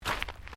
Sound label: Footsteps Gravel Step